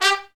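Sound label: BRS TPTST, brass trumpet stabs; Roland L-CD702; BRS Tps Stabs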